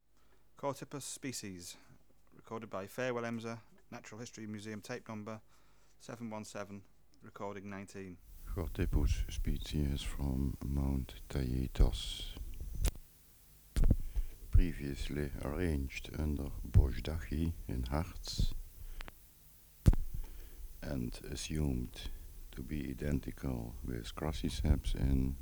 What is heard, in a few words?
Chorthippus